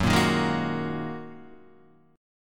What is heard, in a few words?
F sharp 6th Add 9th Guitar Open D, chord